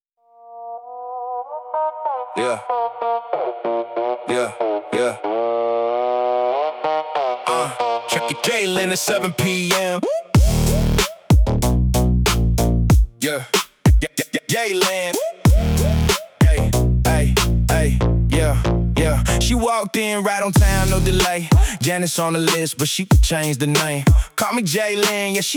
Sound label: Rap